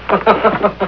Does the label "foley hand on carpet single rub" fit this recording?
No